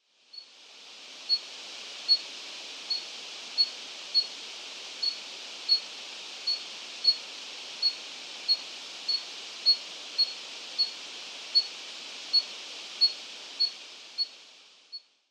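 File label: Sakhalin Leaf Warbler; chichibu; koe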